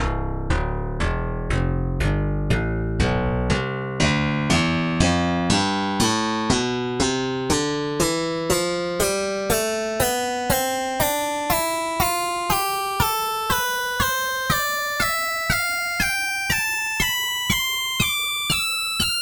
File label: pop bass; tonleiter